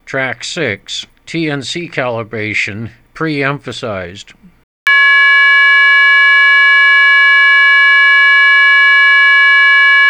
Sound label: kpc3 plus cal tones pre emphasised